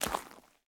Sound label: zombie footstep mud; DeadFright